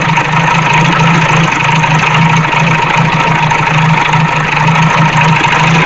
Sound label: uaz idle; veh uaz idle